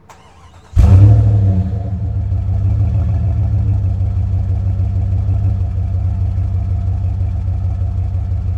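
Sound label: Truck Cherokee Start Idle Loop; SFX Kit; Trucks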